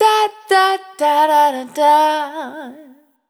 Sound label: Output, Single Voice Melodic Loops